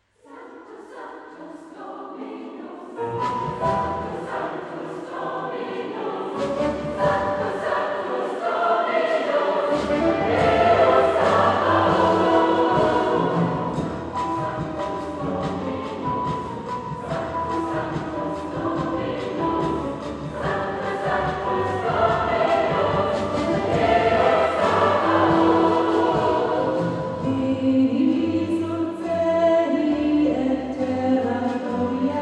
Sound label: The Latin Jazz Mass Sanctus Kath. Kirchenchor